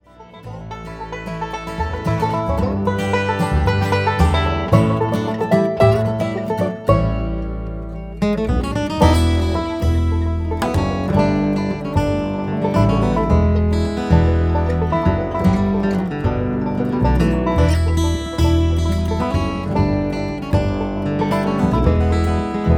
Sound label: Desert Flatpicking